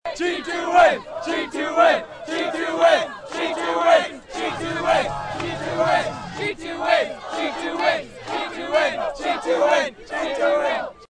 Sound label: Crowd; greasepole